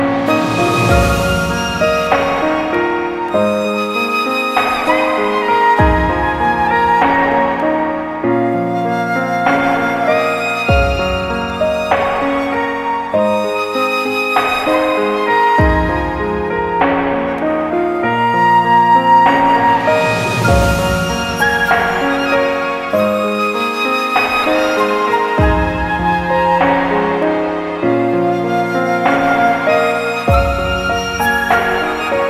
Nhac Chuong Piano

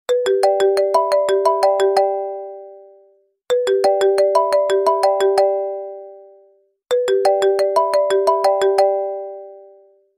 Nhac Chuong iPhone 12 Cool Tone

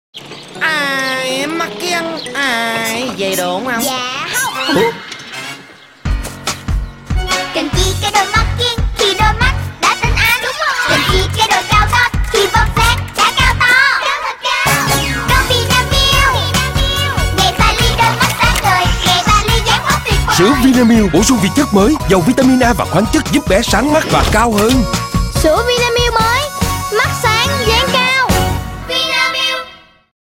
quang cao; vinamilk

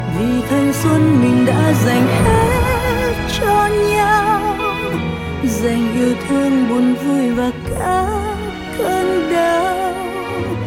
Nhac Chuong

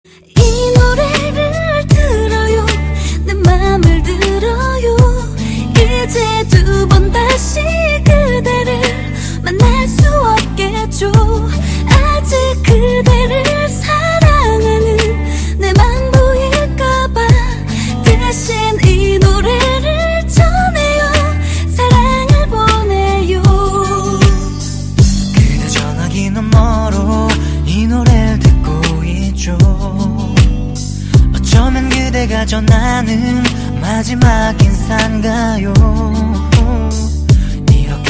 waiting tone han quoc

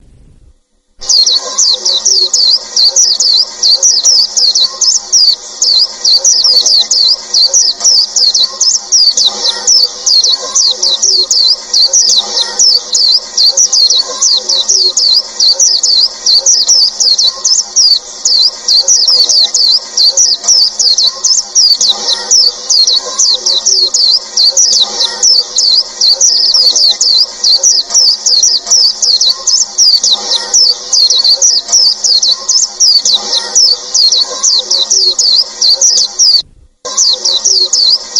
tieng chim vanh khuyen

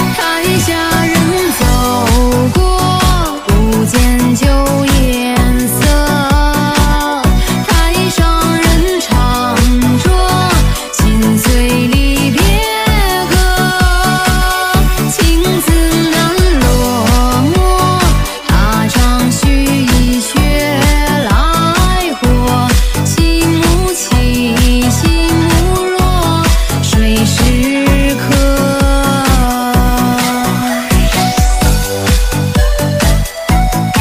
Nhạc chuông remix TikTok

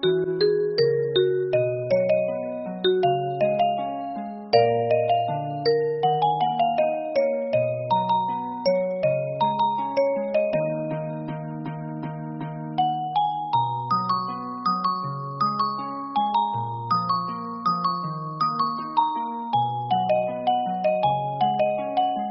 Bao Thuc

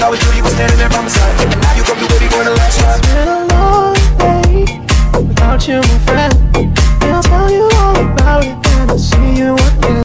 Nhac chuong remix TikTok

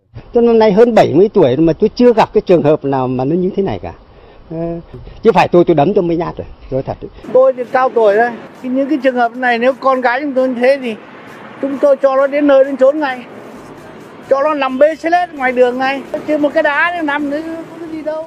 Nhac chuong

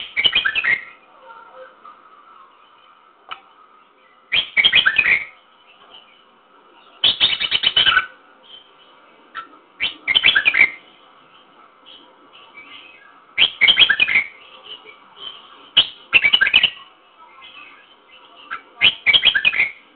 Tiếng chim chào mào hót cực hay

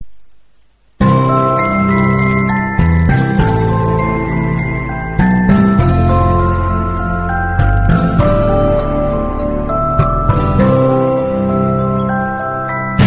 Nhạc nền Game; zing farm

